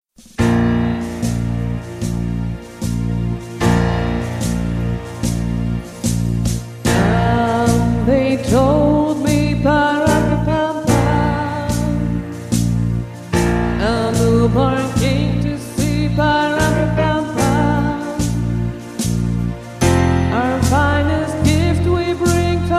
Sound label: drummer